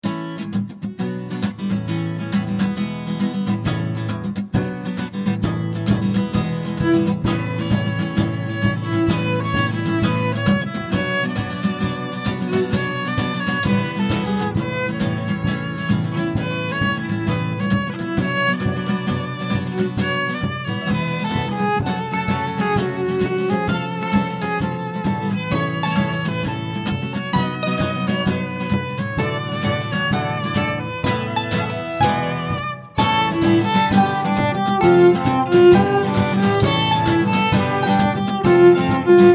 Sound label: exit music